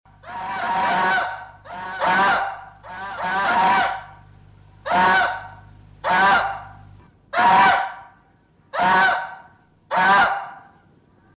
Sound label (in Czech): husa